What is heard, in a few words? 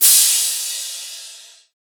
Crash